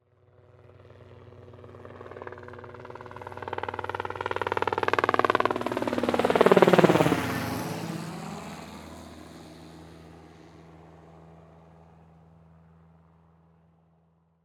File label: Helicopter Pass By; Blastwave FX